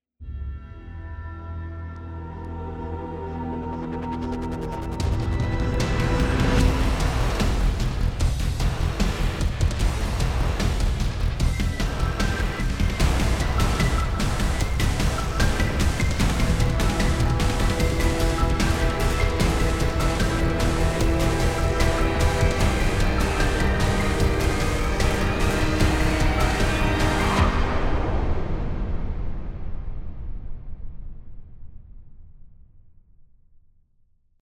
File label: Cinematic; Archylist